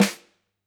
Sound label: Snr, drum